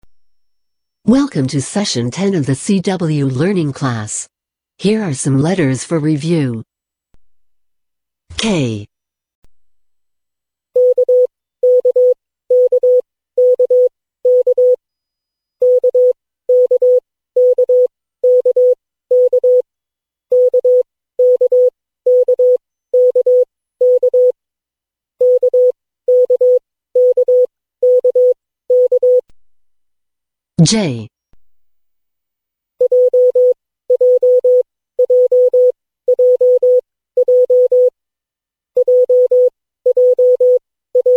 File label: cw training